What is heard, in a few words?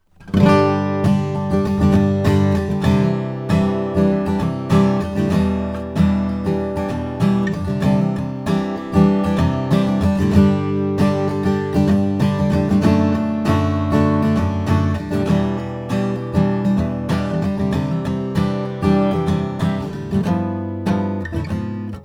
fairbanks f 30 adirondack top; roy smeck